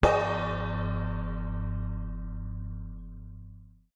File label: musical cymbal crash tap med light hand knuckle